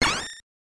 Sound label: damage robo